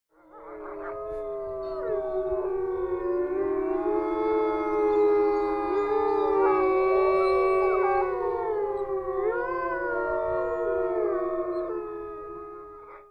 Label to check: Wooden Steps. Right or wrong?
Wrong